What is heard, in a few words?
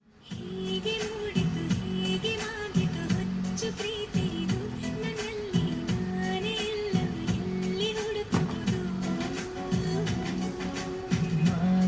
bus bangalore goa